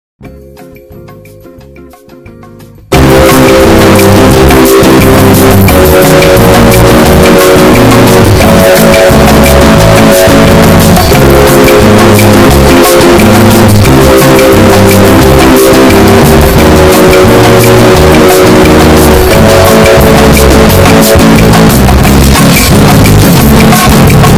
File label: Elevator Music